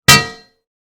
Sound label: hammer metal strikes; DailySounds